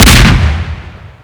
rpg explode

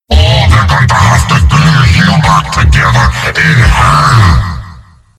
Spawn

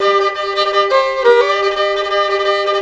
violin slayer, fiddle; music; szf; zombat

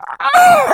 death, infected; npc; die; szf

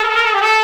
trumpet danger; music; szf; zombat

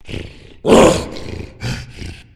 tank voice, idle; szf; player

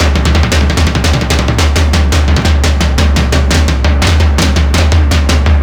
drums, horde; music; szf; zombat

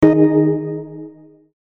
event mild jingle

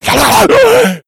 rage at victim, infected; npc; szf

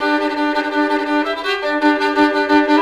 violin slayer, fiddle; music; szf; zombat

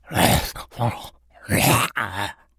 mumbling, infected; npc; idle; szf

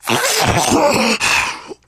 spitter lurk; voice; idle; szf; player

